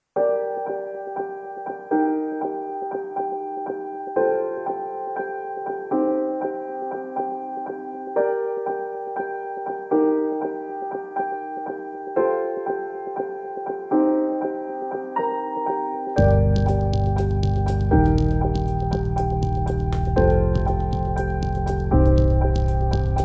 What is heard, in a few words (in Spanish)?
Música Para Documentales Suspenso Drama Música Cinematográfica